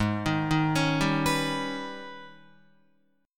G sharp Minor Major 11th G sharp, Guitar; arpeggiated; JGuitar

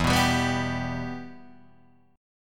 E-Minor, Guitar, Open D

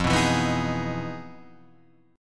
E Major 7th F; Emaj7/F; strummed; JGuitar

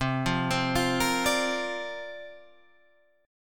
C 7th Suspended 2nd Suspended 4th C

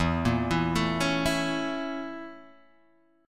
A sharp Minor Major 7th Flat 5th

